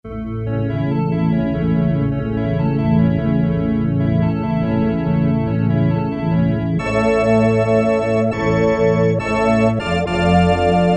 Pipe Organ + Chorus, Casio CT-370; Tones

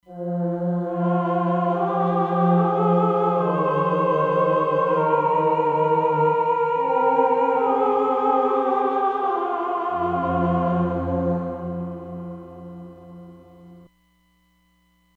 3D Vox, Roland Fantom XR

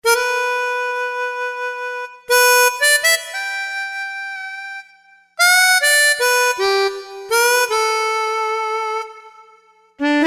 Harmonica

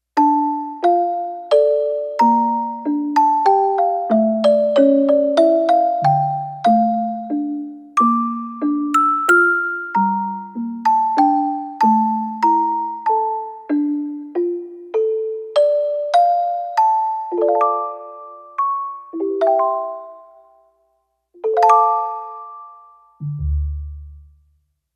Music Box

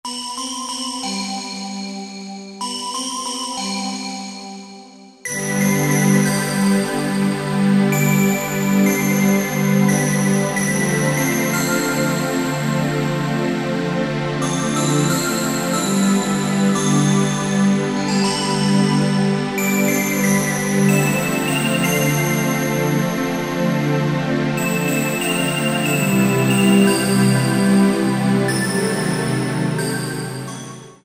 Spectagon & Pad, Korg TR-Rack Combi

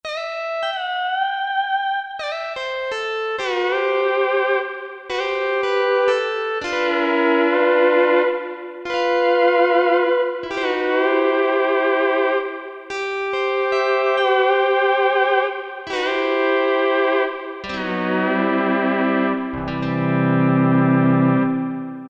Pedal steel, Korg MSC-6S Fretted Insts; Fretted Insts 1

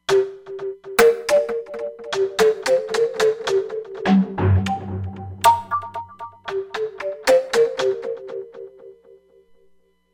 Noisemaker, Roland Fantom XR

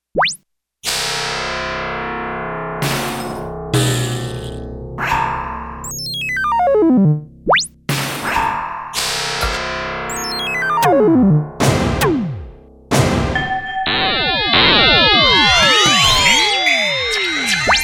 FX Menu

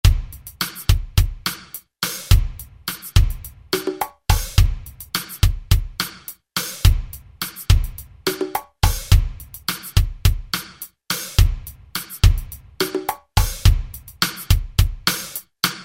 Latin, Alesis SR-16; Preset Patterns

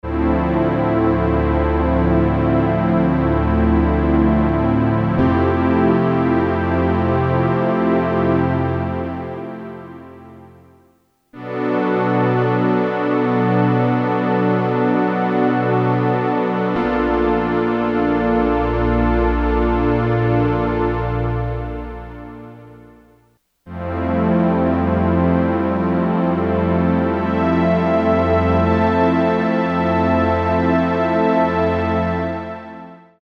DWGS PAD, Korg DSS-1

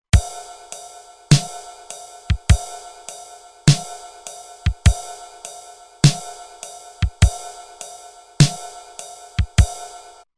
Shuffle, Roland TR-505; Factory Rhythms

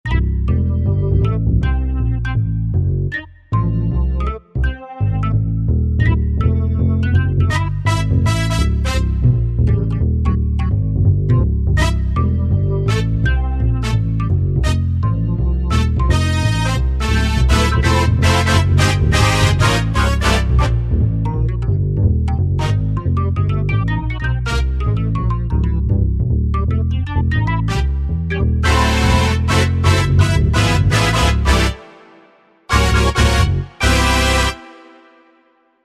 Jazz Hits; Korg TR-Rack

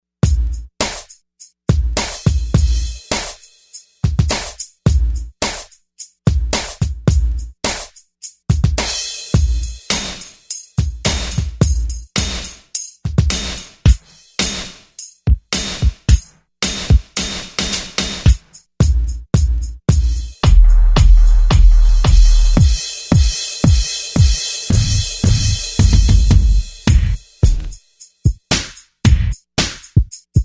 Pop-R&B Kit, Korg TR-Rack

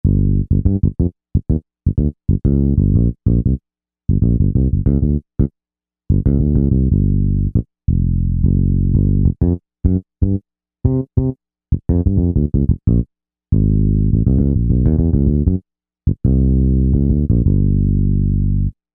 Finger Bs, synth

